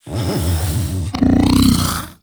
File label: Vocal Breathe Slow; Warframe; Hunting Critters; Undazoa